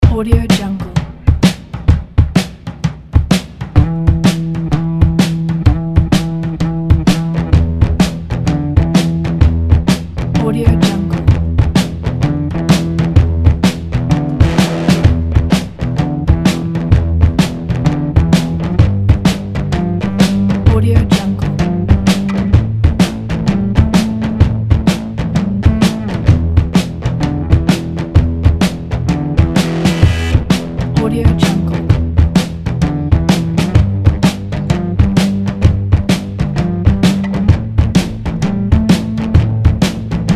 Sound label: vintage shuffle rock